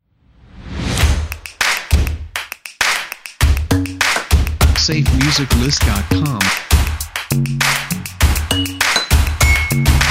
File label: Drums Urban Percussion Intros; SafeMusicList